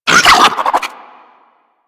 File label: Infested Biped Flip; Warframe; Moa